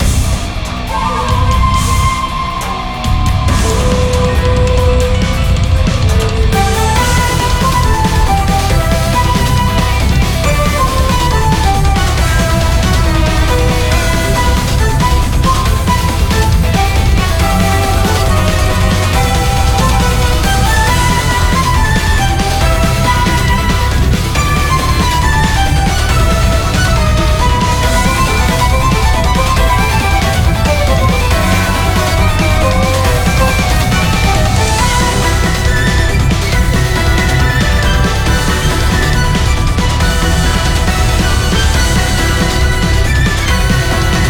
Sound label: bgm battle boss; longgong